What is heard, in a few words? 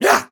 Attack Vo; portal quest android; Rebel